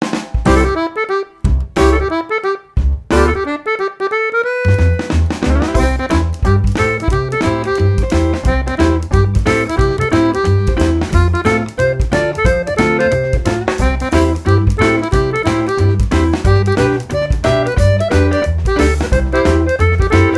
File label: Ragtime Boogie